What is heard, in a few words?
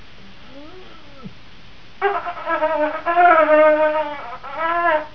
trumpet